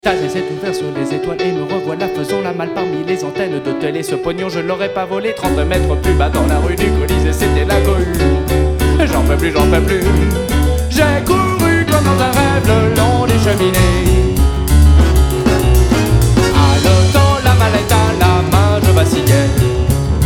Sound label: Jazz